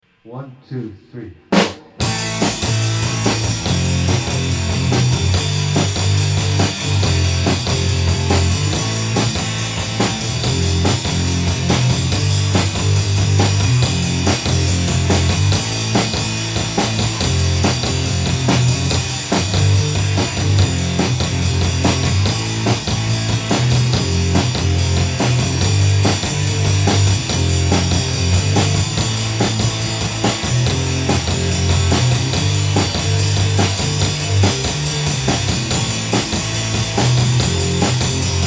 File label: Final Solo Practice Chords